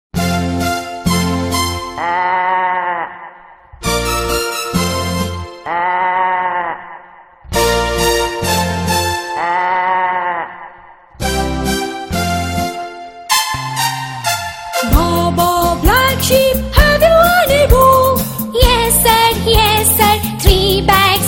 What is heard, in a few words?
baa baa black sheep